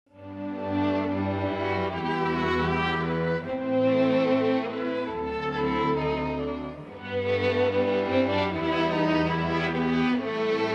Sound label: Strings More